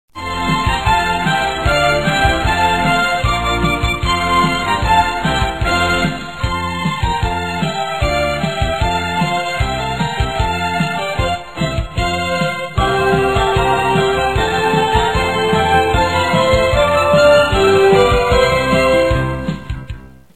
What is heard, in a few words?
ringtone christmas music